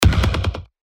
PERC Orange, percussion; revealed percussion vol 2

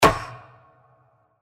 PERC, percussion; Gorochu; revealed percussion vol 2

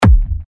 TECHKICKS A, techno kicks; Bulletproof; revealed techno kicks vol 10

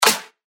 Clap; Colosso; revealed claps snares vol 2

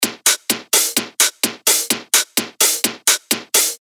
128 BPM; Revealed Top Loops Vol. 4

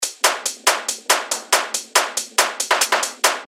techno loop 140 BPM; Doomstar; revealed techno top loops vol 8

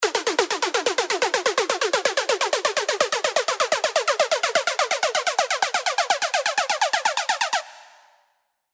FX Lazer Riser, serum 2 bass tech house; revealed serum 2 bass tech house vol 1

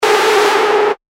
Synth Shot G#; synth shots tech house; Vampire; revealed

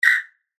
Whistle; revealed percussion vol 3

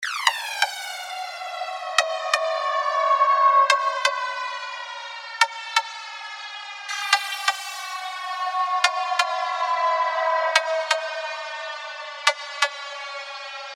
Perc FX Loop 140 BPM; Scorpion; revealed fx loops vol 2

